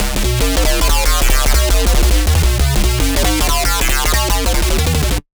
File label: Fill Acid 180 C#; Fill Acid2 180 C#; exciplex sample presets pack; JinoBeats